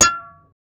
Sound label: a metal star ship getting, ship; sound effect